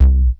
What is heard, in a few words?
Bass Synths hits and octaves